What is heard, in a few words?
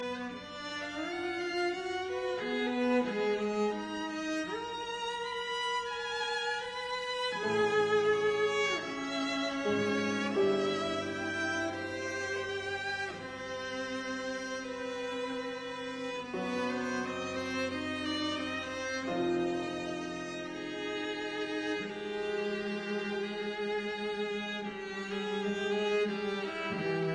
Piano Trio Slow; Harrison; Mirecourt Trio